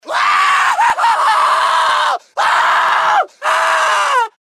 scream, male; gmod; npc

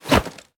melee attack knife; viper

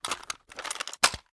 plr ar reload empty xmag end, weapons; viper

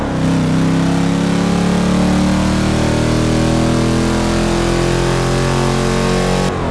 second, vehicles; gmod; tdmcars; pon transam

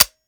weap fire first; gmod; viper; weapons; scharlie

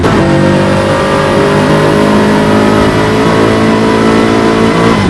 fourth, vehicles

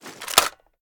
ar reload fast dmr mag out; weapons; gmod; viper; scharlie

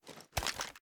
reload empty fast end; reloads; gmod; iw8

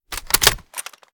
kilo98 reload bolt open; viper

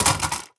phys mag drop large drum concrete, magazine drops

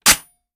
reload empty xmag charge; gmod

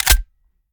fast reload empty slide; gmod

